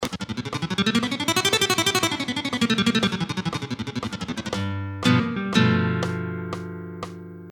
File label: Picado, flamenco; FLAMENCO FACILE